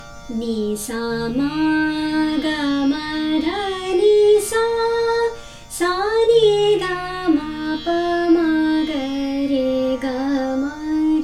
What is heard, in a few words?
Kaunsi Kanada Aroh